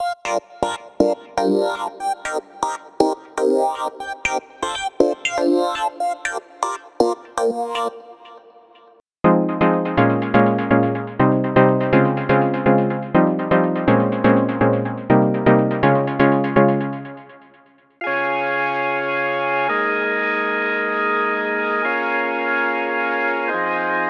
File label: Disco